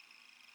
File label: tape play loop; sons of the forest